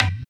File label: EQ Perc, Percussion Mix